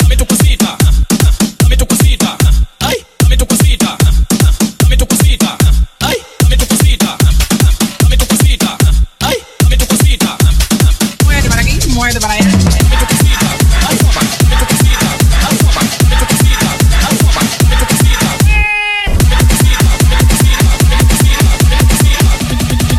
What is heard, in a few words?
Dubstep Remix